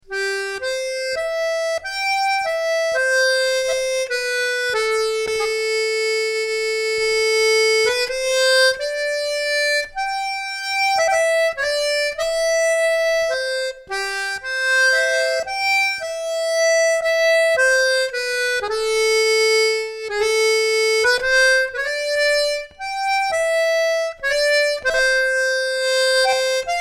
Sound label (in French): valse; Diato; valse de Saint Brain